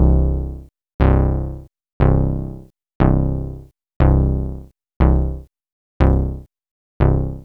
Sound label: Moog bass; AMG - Vince Clarke Lucky Bastard